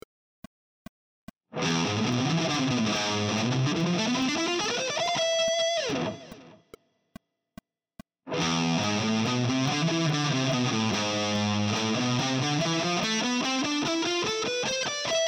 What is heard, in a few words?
harmonic minor